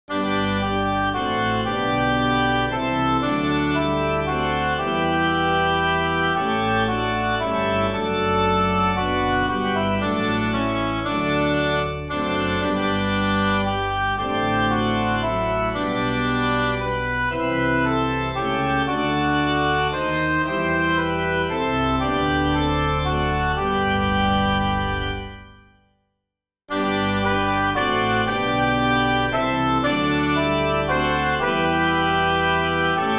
Descant; O Jesus Joy

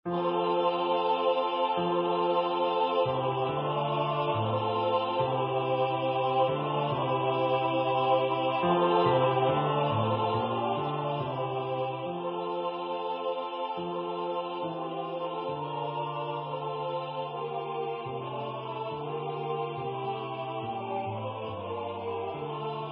Bass; Rachmaninov Bogorodyitse Eng

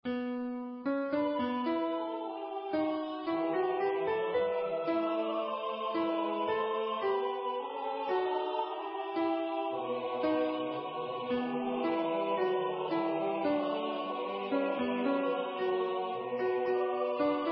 Missa Brevis Sanctus Alto; Graziani Missa Brevis a 4; Missa brevis a 4 (Bonifazio Graziani); MP3 Practice Files

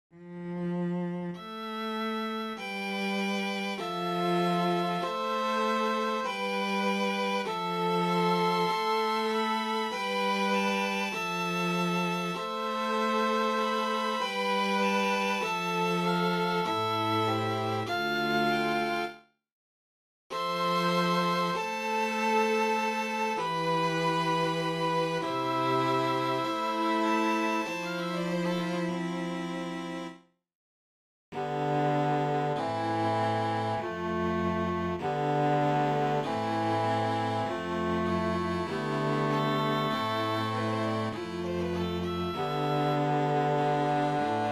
voice string quartet; Risher Tim; Ekyrie

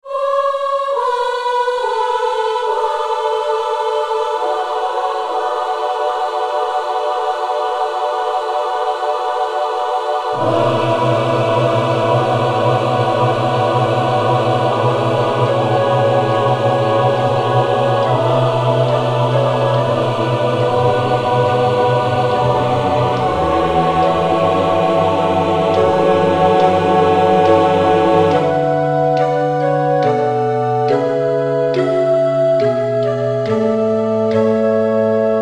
solo satb and ssaattbb; Lulajze Jesuniu

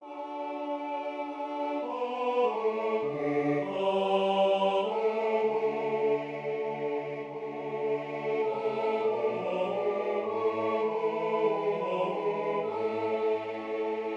Tenor Prominent

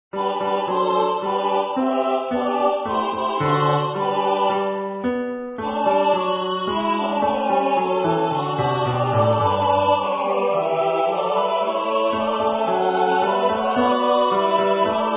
Bass; Jubilate Deo

